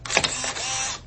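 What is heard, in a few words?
photo on an old camera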